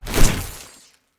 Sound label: Lightning Grenade Throw; Weapons; Enemy